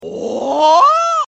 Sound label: OOOHHH, reverse